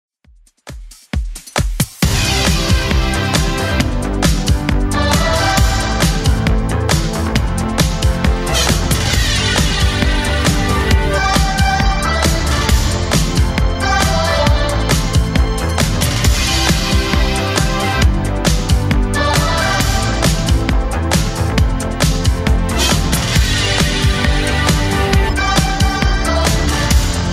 80s Club Edit 135bpm; remixplanet

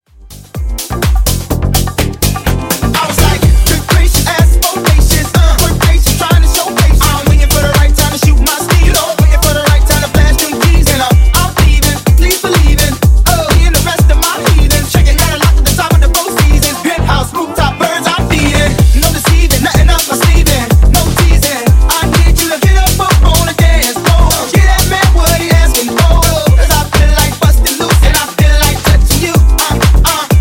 Funk Clean 125 Bpm

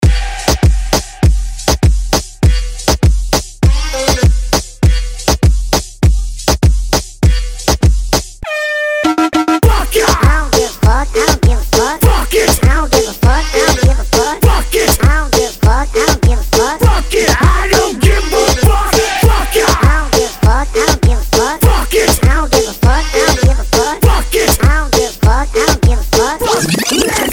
Mombah Remix

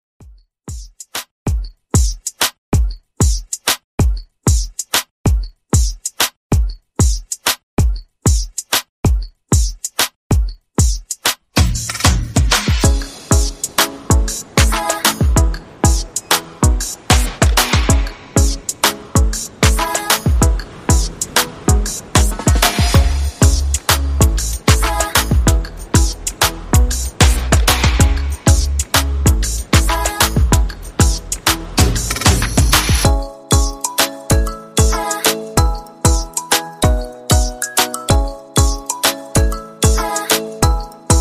OPM 95 Bpm

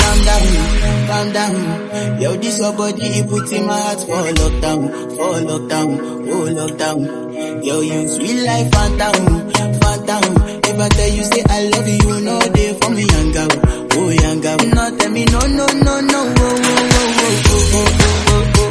110bpm Abm

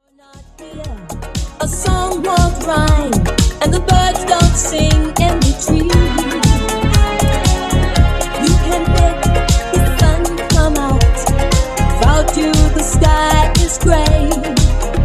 Funk Nu Disco 120Bpm 3 Different Basslines; Edits

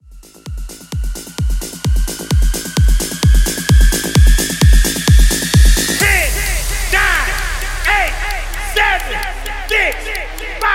Hype Countdown; Remixfreakz

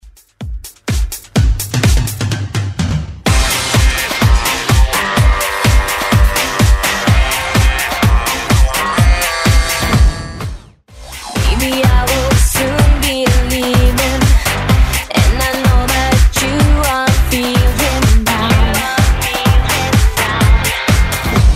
90s 126bpm; Redrum

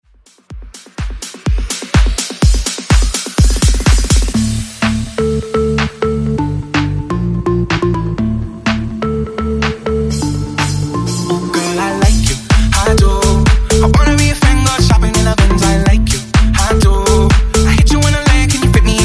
Top40 Deep House Mashup

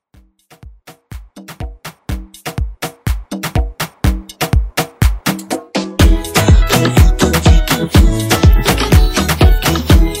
Latin Redrum

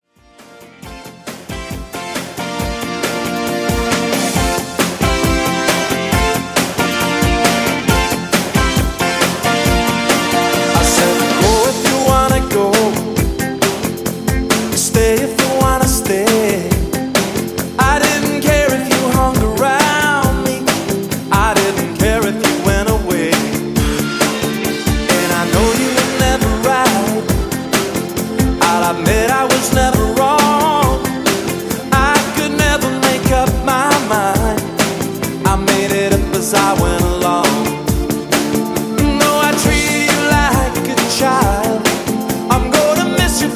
80s Rock Redrum 136Bpm

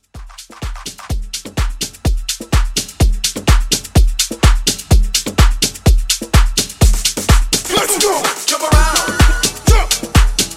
Mashup Hype Edit

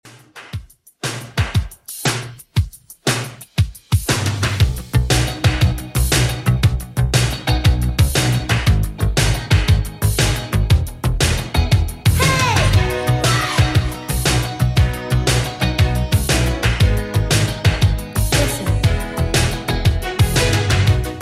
80s Redrum 118bpm

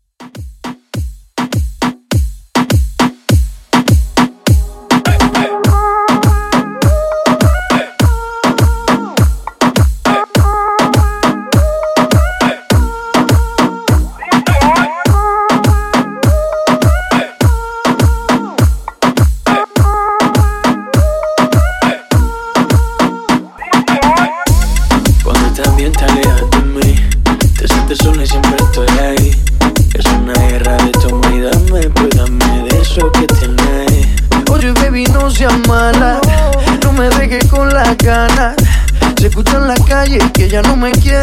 Party Moombah 102Bpm

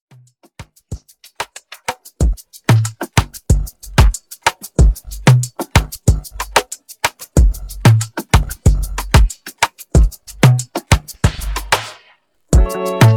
Epic Intro Re Drum 93 Bpm

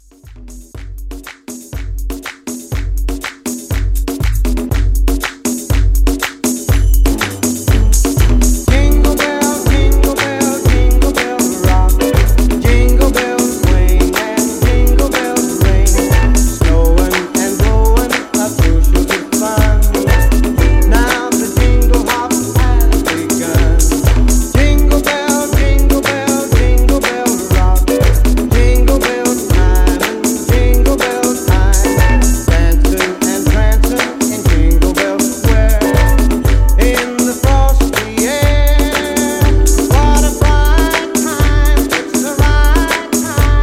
Christmas Re Drum, 121

